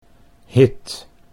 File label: hit